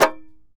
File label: tar; Roland - LA Composer vol. 3